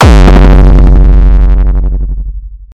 Vibrakick Short; Wreckage 7 Samples

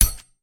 Headshot Sound

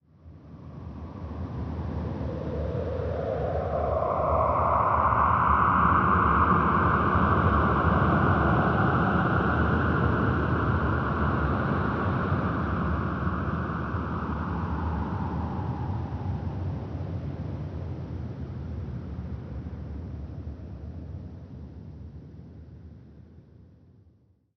Horror Ghost

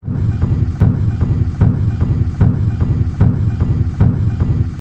Hell Drums

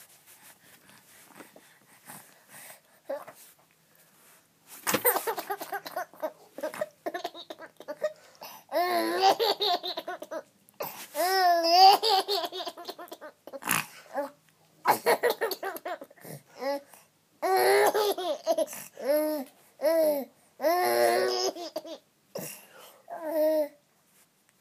Baby Laugh And Snort

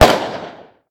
Pistol Shot

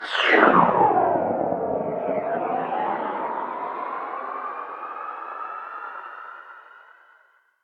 Meteorite Sweep Whoosh

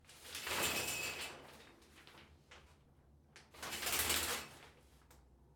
Shower Curtain

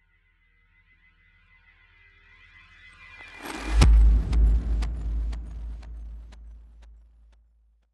Trailer Hit

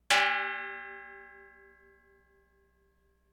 Giant Cowbell No Mute

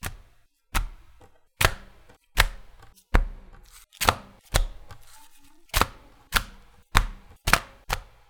Notebook Dropping

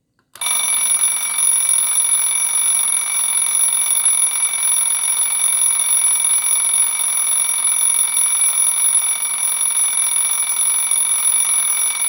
Twin Bell Alarm Clock Continuous Ringing

